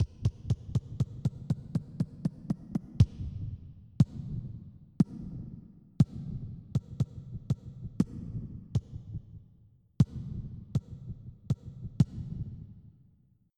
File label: Heart Beat